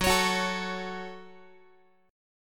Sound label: F sharp Minor F sharp, Guitar; strummed; JGuitar